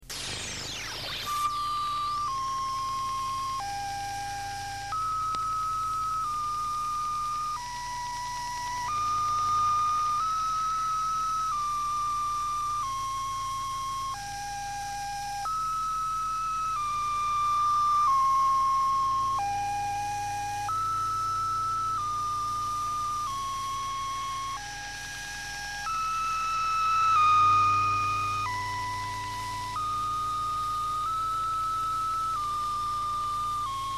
XPH slow version

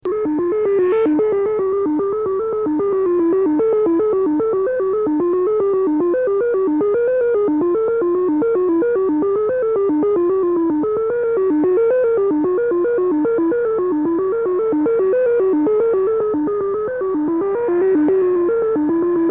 10166khz, tones

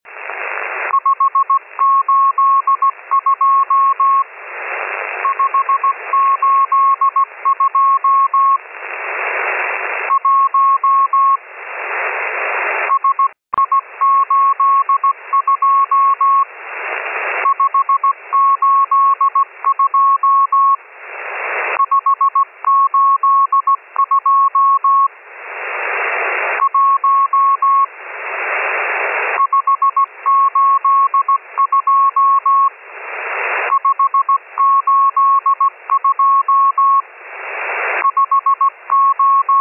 morse

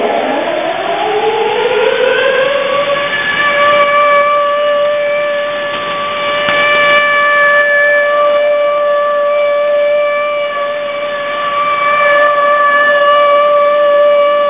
Air raid siren 6911 khz; Air raid siren, 2024-11-20, 1951 UTC, 6911 kHz

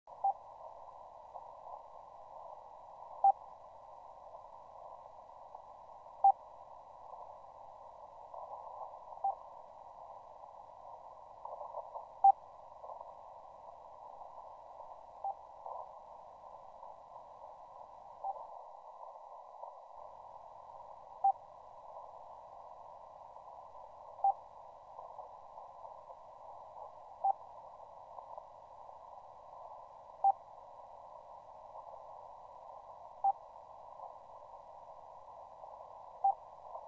pips; Unid pips. Various freqs.

